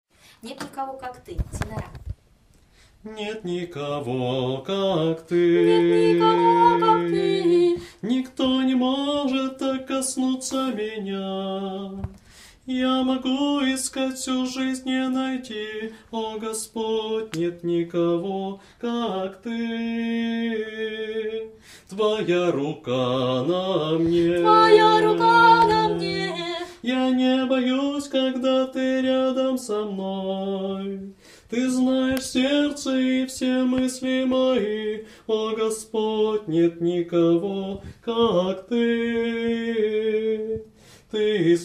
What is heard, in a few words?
tenor